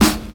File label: sparkle snare a key